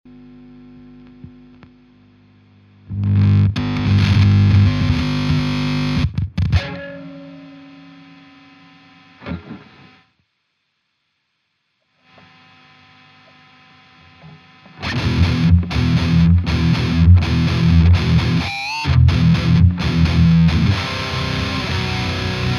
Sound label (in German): Rauschen